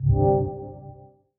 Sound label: Wireless Power Connected; Honor; ui; MagicOS 7.1